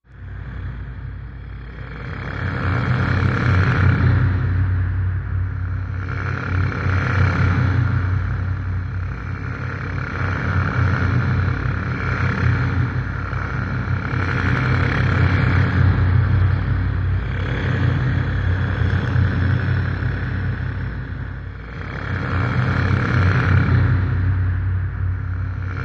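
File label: Phosphor Wave Medium Electric, energy beams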